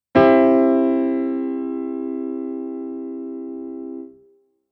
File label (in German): rein C dur